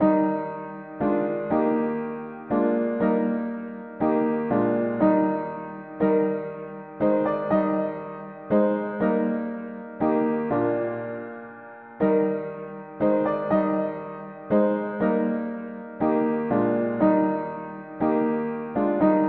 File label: mono piano; To Thy name Lord Jesus gathered; hymns